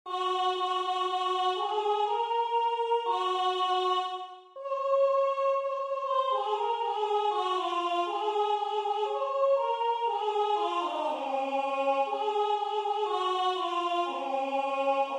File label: rhythm